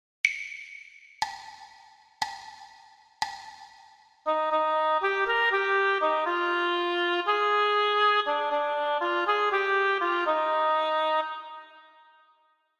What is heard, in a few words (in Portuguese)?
percepcao ditado